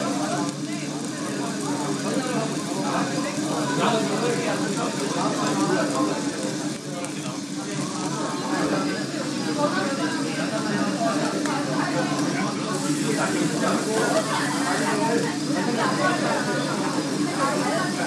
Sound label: Sizzling pork in Yeouido Seoul Korean ambient noise